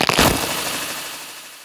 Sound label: bramble layer; weapons; dota; dark willow